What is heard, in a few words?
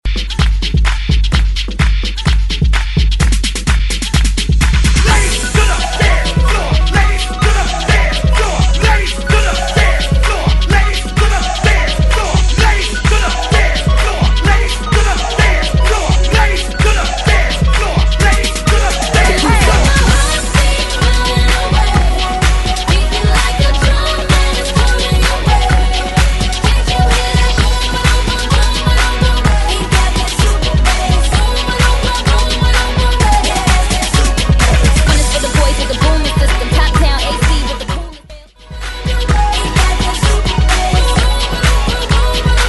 HYPE BMORE